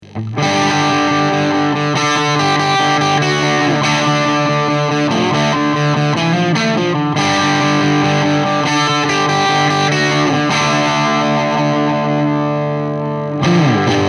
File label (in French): P90 lead, Canal 3 à fond, P90 bridge; White Jack, un 3 canaux; AMPLI